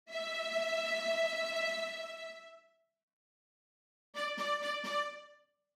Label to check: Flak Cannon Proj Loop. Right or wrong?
Wrong